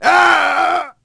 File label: ahhh